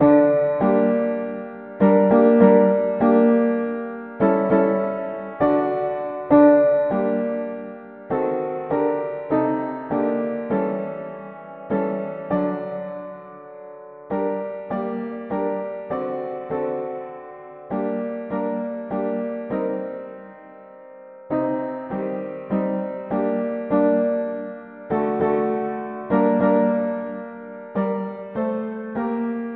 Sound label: mono piano; hymns